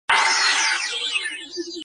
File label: ray gun effect